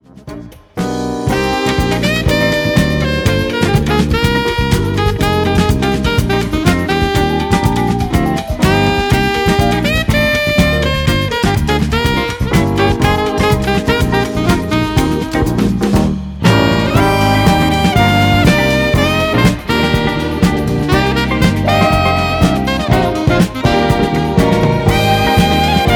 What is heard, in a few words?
jazz sax